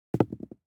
DICE on Felt, Throw and Roll, Standard, 2 Two Dice; Samples; ArticulatedSounds; DICE Sfx Party (Extended)